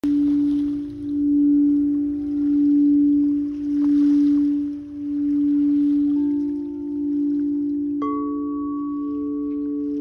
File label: Crystal bowl; کاسه کریستالی